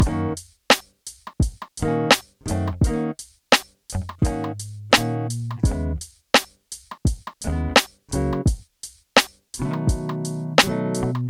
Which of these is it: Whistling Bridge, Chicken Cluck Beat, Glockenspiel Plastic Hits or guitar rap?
guitar rap